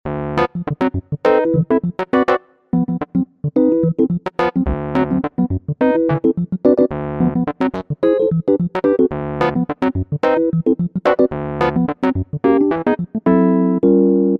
E Piano

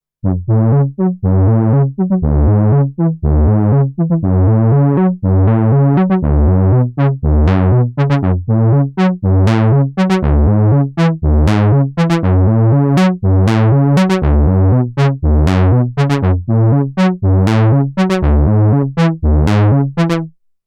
Moog Mother 32 Patch Brass Accents

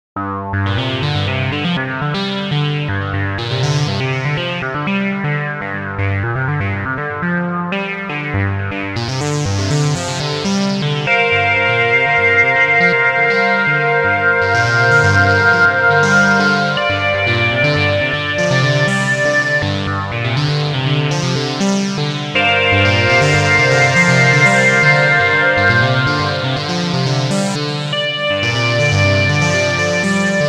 OB-E Velocity

